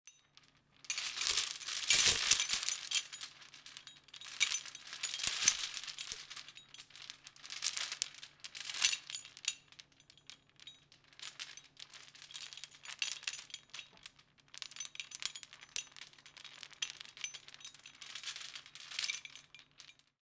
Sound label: Old rusty chains handling